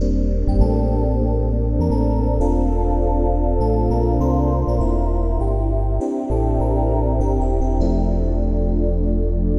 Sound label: chill and ambient piano lofi; looperman